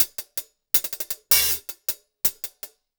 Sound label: Top Ends; Zero-G; Monster Beats